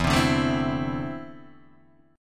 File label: E 7th Suspended 4th Sharp 5th; E7sus4#5 strummed; 0,3,0,2,1,0; JGuitar